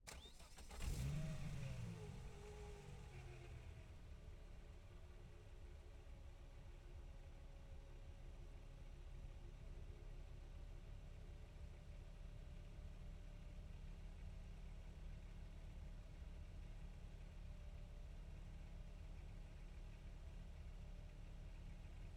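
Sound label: volvo 850 exterior start idle blips off, Volvo 850 SE 2.5 1996; Holophone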